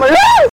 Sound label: Panic Scream